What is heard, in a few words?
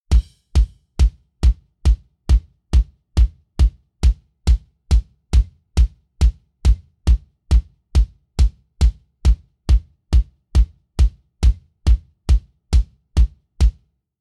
layered sound kick layered with eq